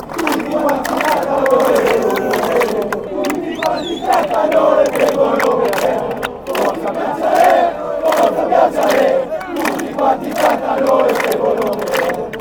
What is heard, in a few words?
drinking